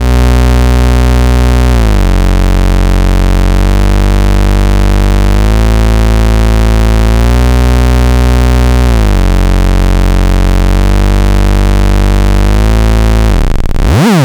C64 Slide Bass, Commodore 64, Chiptune; 8-Bit

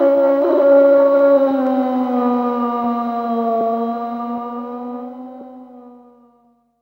loop; X-Static Goldmine 3

